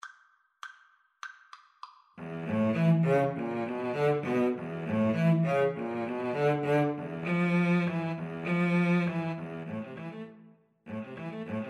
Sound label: violin cello duet